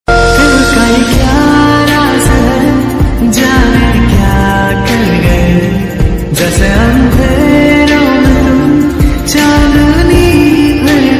aesthetic hindi ringtone

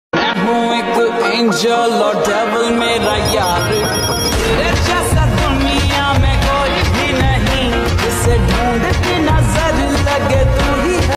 (slow) hindi ringtone

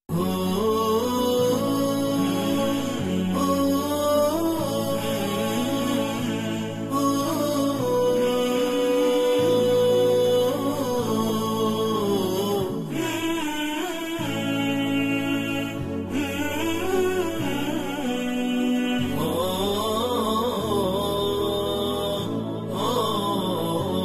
Islamic BGM ringtone

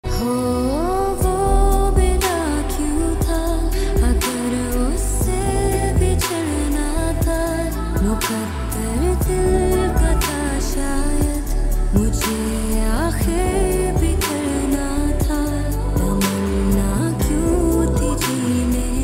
female version hindi ringtone

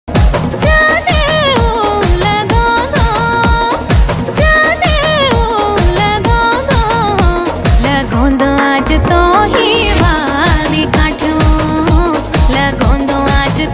uttrakhandi ringtone

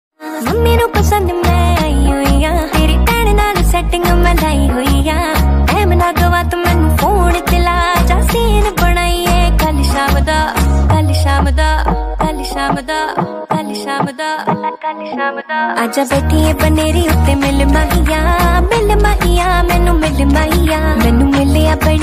panjabi ringtone

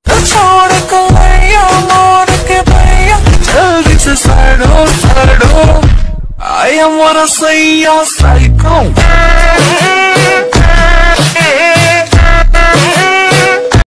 lofi remix ringtone

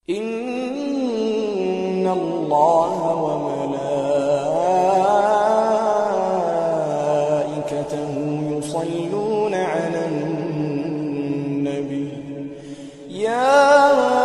Quran Recitation arabic ringtone

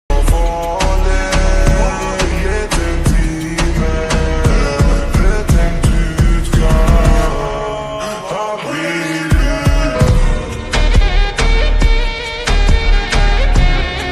slowed reverb english ringtone

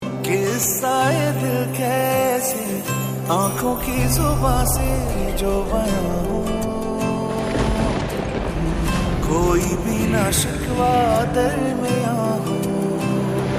OST pakistani ringtone

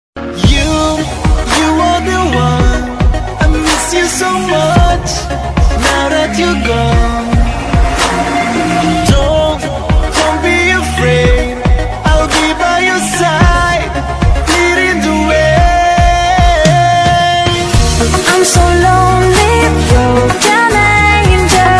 english ringtone

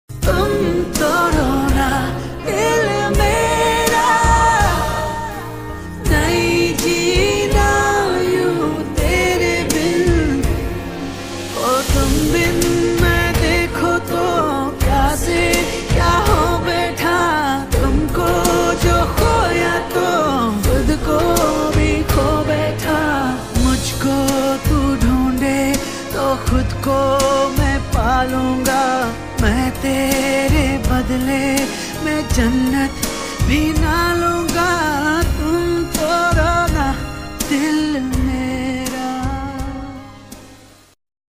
hindi ringtone

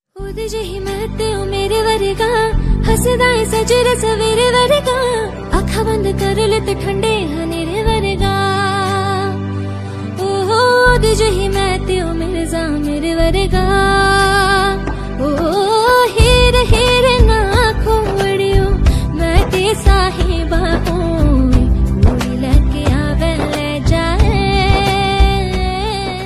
hindi song ringtone

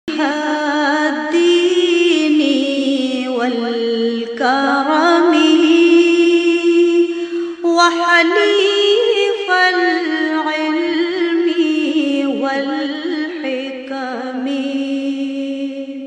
arabic ringtone

